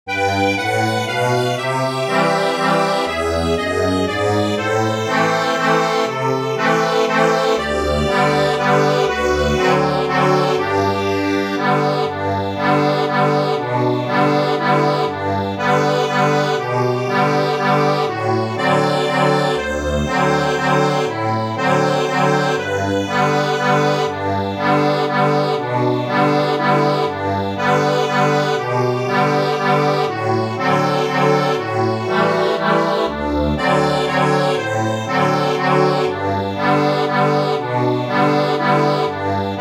ACCORDION

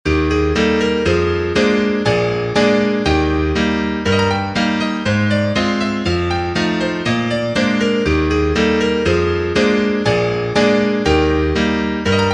Intermezzo, piano

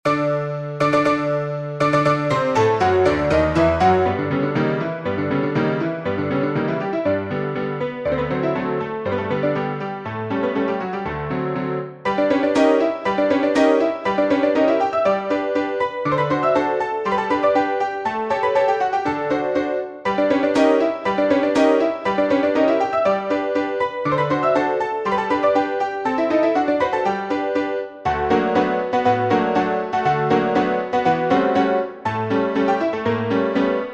polka; Hilarity

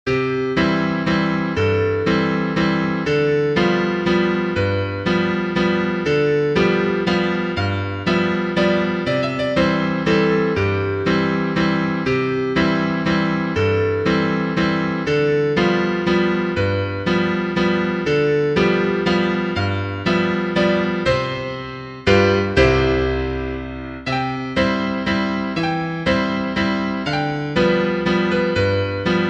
PIANO

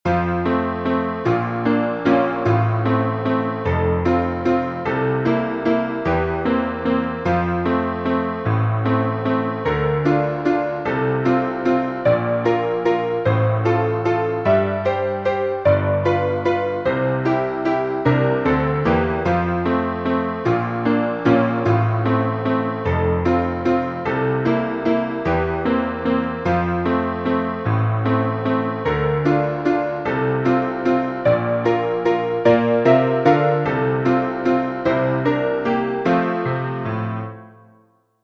piano, sheet music

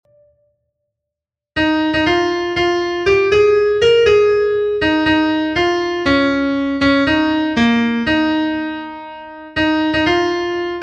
LEAD Ab

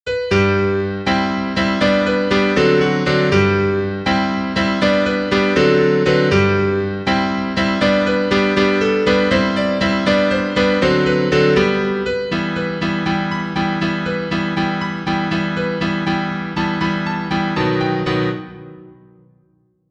piano, sheet music

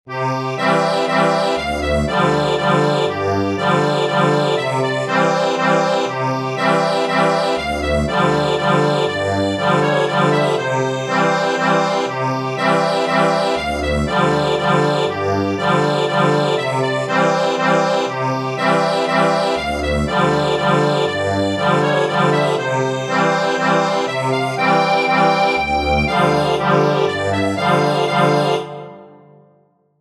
Swedish Dance

